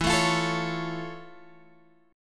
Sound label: F Augmented Major 7th E